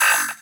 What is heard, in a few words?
bass3